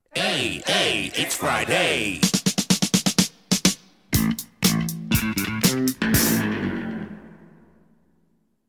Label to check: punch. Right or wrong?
Wrong